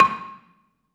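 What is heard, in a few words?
Balafon 2 C5, Asian Traditions; Soundscan vol.60; AKAI; BELAFON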